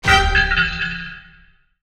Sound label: Coin String; godsoffortune